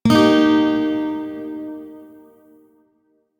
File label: Shawzin Pent Min Chord H; Music; Warframe